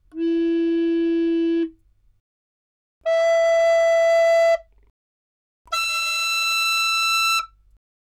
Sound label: AirReed Record; 気鳴楽器; Recorder